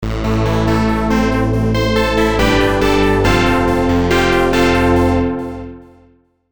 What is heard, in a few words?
Synth